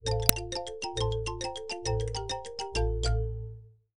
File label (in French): Infobulle